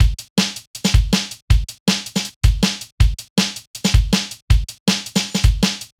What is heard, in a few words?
Beat, Drum N Bass